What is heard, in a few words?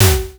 game damage